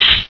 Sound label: HORSE RAC; Canon CameraWindow